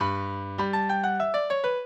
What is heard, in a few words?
minuet, mozart